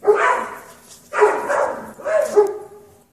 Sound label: Riding Dogs; SyrinScape SoundsScape Collection; bbh Haunting part 1; TTRPG